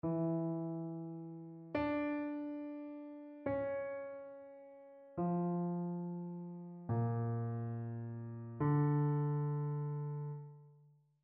interval chains

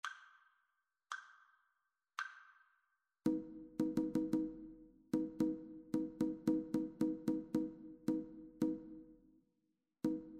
rhythmic dictation

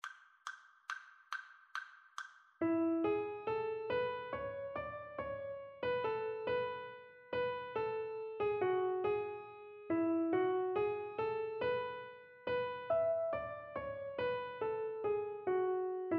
melodic dictation; eartraining

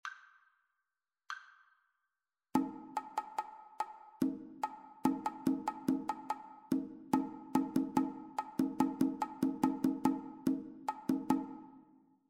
two part rhythmic dictation

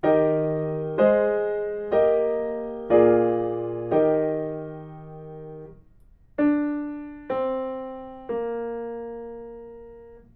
melodic fragments

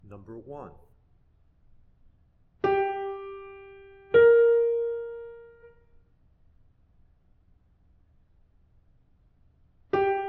intervals; eartraining

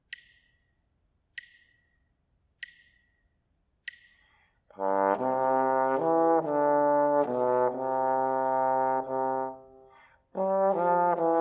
melodic dictation; ear training

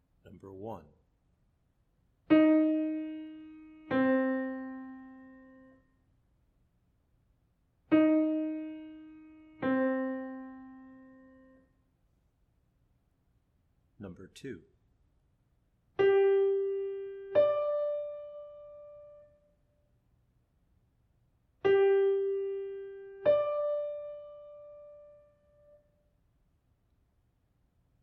intervals

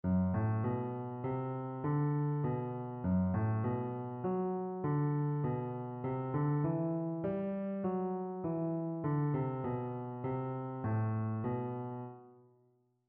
mode identification; eartraining

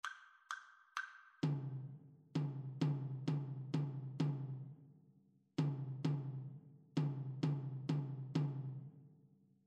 rhythmic dictation

